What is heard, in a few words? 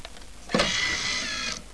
cd-rom; mesa